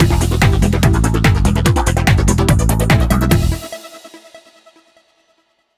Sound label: Stab; Buzz! Junior Robojam; PS2